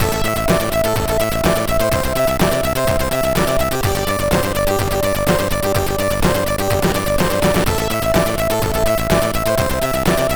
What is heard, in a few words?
sid CSG8580R5